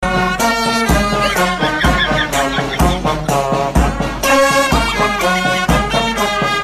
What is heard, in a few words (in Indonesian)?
terompet; ember